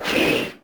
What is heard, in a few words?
Window Slide Open; SFX Kit; Foley - Misc